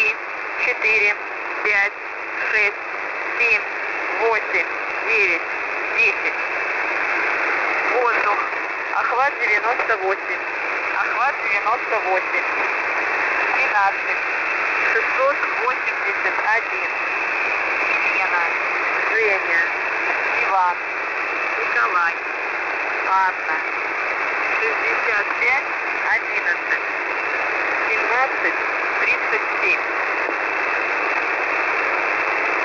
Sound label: usb msg